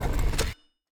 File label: gun reload; World of Tanks